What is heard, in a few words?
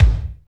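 kick, Techno Rock; Northstar; Drumscapes Roland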